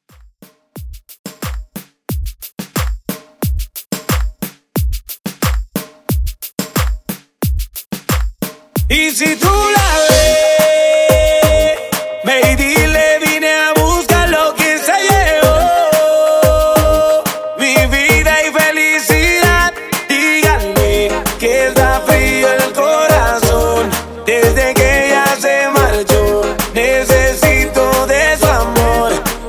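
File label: Latin Pop Reggaeton 90 Bpm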